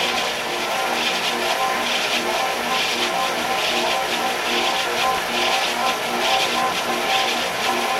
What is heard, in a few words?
Pump Working Loop; Glucosoid Pump; rimworld